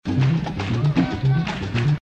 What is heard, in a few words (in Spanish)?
clave